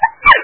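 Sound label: sneeze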